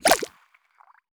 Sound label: Grab Wall